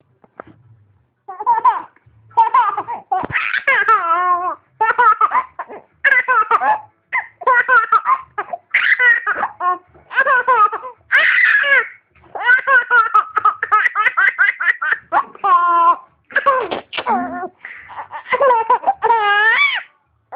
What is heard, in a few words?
Baby laughing